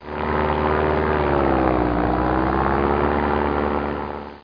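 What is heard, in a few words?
airplane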